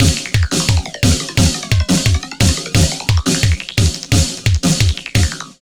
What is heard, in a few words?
loops 175 bpm; SPEED KILLS; Masterbits - Tekkno Trax